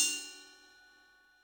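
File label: Ride B, Yamaha DTXpress Cymbal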